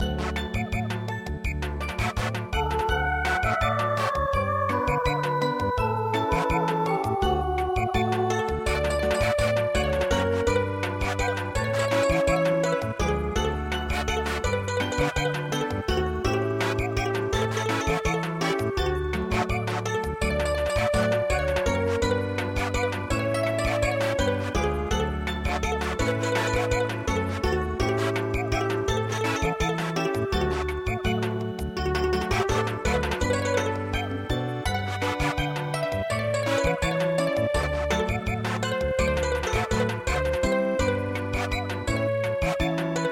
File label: Samba, midi